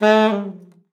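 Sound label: slide A3, saxophone; Best Service ProSamples vol.42 - Session Instruments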